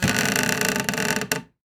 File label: window; ambient; inferno